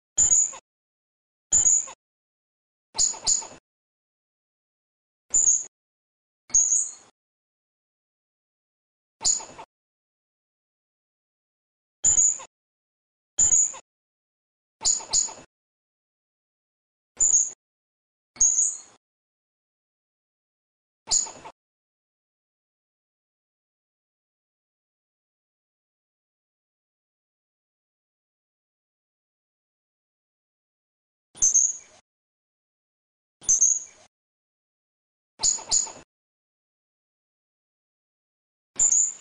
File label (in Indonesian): suara bajing